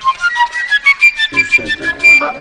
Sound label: flute sample normal